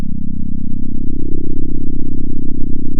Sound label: center, sound of a small motorcycle